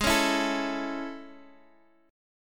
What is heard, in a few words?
A Major G sharp, Chord